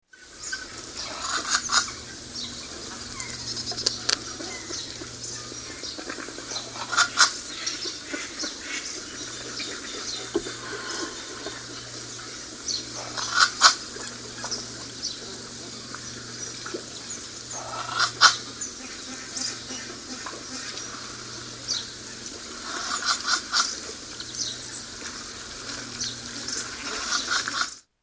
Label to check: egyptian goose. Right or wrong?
Right